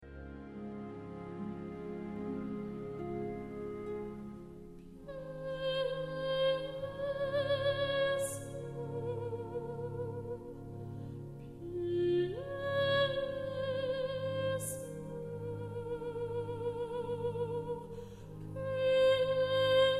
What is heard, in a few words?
vocal